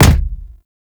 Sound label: Sphere Projectile Bounce; Warframe; Warframes; Gyre